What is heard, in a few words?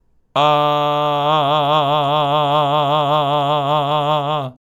vibrato G a fast; ビブラート